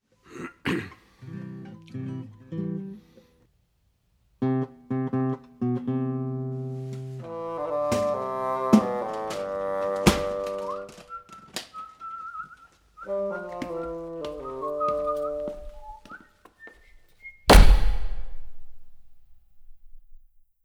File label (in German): Schluss Akkord